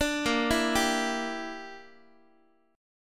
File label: A sharp 6th Flat 5th A sharp down Guitar 4A,4E,5A,5D,5G sharp,6C sharp, chordshape; A#6b5; arpeggiated